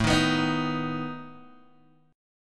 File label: A Suspended 2nd Flat 5th A down Guitar Open D